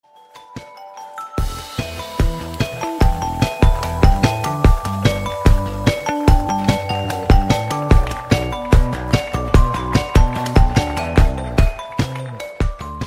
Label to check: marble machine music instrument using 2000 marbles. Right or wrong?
Right